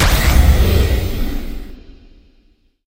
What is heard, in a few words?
click labyrinth